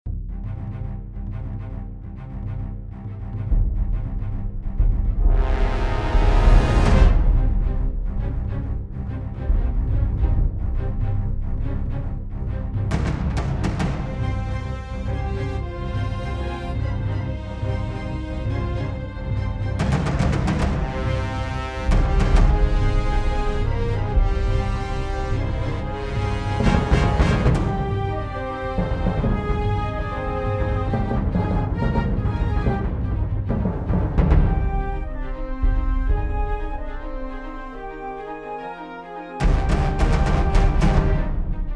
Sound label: epic with drums